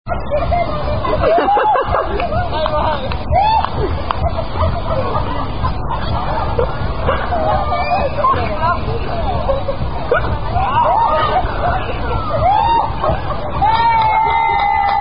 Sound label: Dizzy Stick In Germany; Cala Ratjada Urlaub 2008 Son Moll Trinkspiel